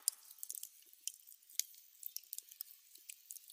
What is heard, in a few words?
Campfire; outdoor; Camping